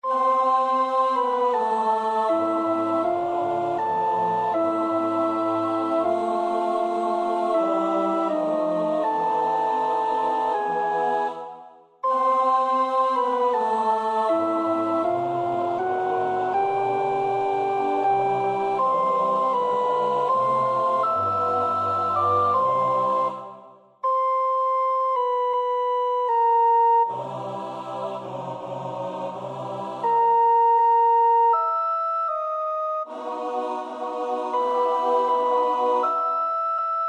sopraan; Hensel (Fanny); Gartenlieder

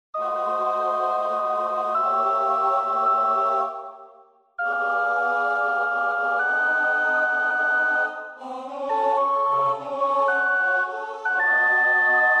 sopraan, koorpartij oefening; Bruch (Max); Moses op.67

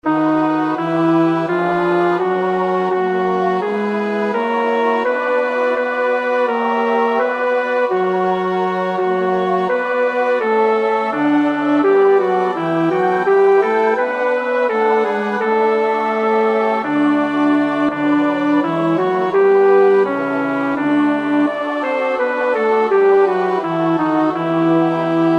Koraal bas, koorpartij; BWV 244 Matthäus Passion; No 46. CHORALE-Wie wunderbarlich ist doch diese Strafe! (koor 1 en 2)

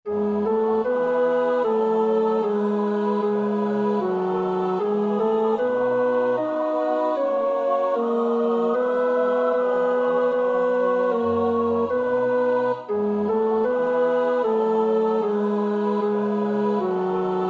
tenor, koorpartij; Bach (Johann Sebastiaan); BWV 139

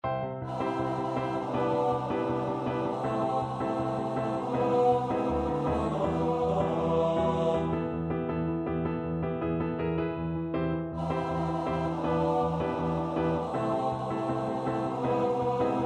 alt, koorpartij oefening

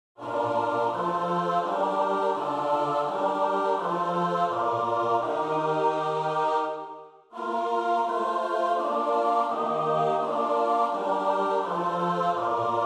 S-A-T-B, koorpartij oefening; Bach (Johann Sebastiaan); BWV 246 Lucas Passion; Nun ruh, mein Erlöser

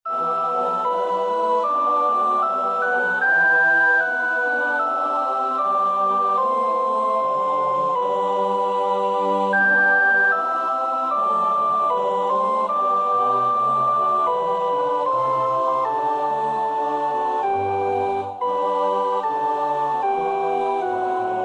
sopraan, koorpartij oefening; Bach (Johann Sebastiaan); BWV 198

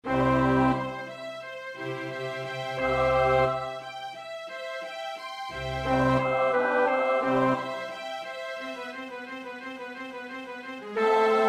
bas; Bach (Johann Sebastiaan); BWV 071

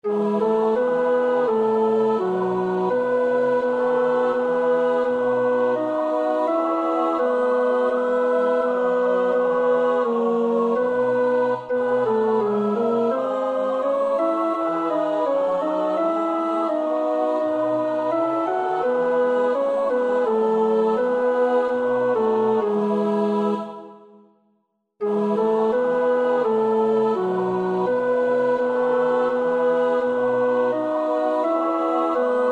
Hymn, tenor, koorpartij oefening; Somervell (Arthur); The Passion of Christ

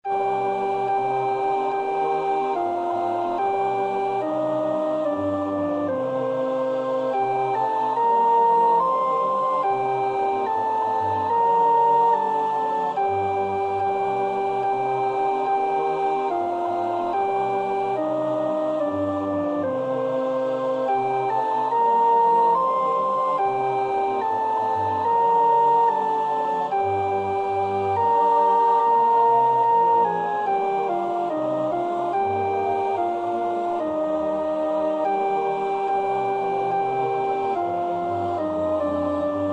sopraan; Bach (Johann Sebastiaan); BWV 018; 5. Ich bitt' o Herr, aus Herzensgrund

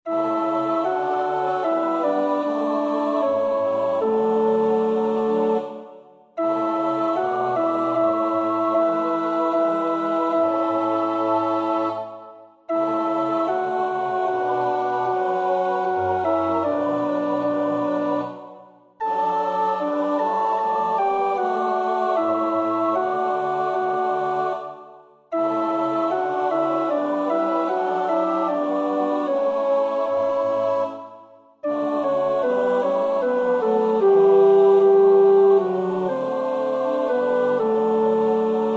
alt, koorpartij oefening; Bach (Johann Sebastiaan); BWV 163; Führ auch mein Herz und Sinn

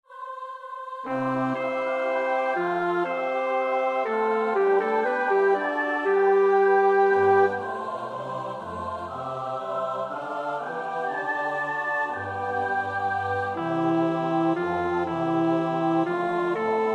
In dulci jubilo vers 2 (bas 1); Praetorius (Michael)

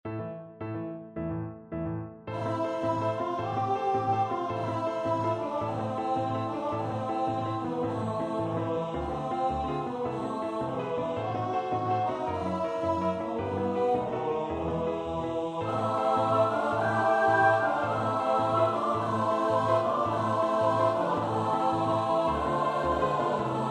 S-A-T-B, koorpartij oefening; Brahms (Johannes); Zigeunerlieder; He, Zigeuner, greife in die Saiten

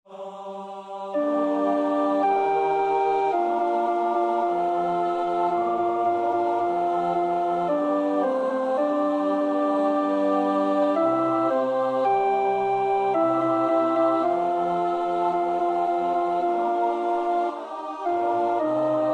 alt, koorpartij oefening; Schütz (Heinrich); Matthäus Passion SWV 479

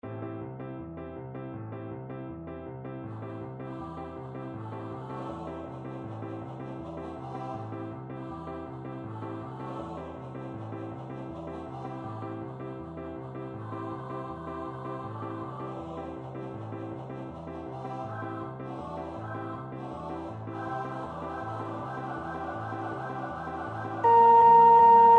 KOOR 1 sopraan 1; Orff (Carl); Carnina Burana

